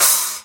dark energy splash mark i choke, Paiste 10" New Signature Dark Energy MK I Splash Cymbal